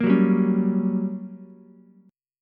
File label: G Minor Major 7th Flat 5th, G, down, Ukulele; strummed; JGuitar